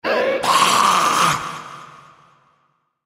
feral ghoul aware; npc

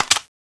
clip out; tfa cso; mp40

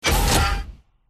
leg lift; gow; silverback

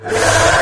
attack, imp; doom3

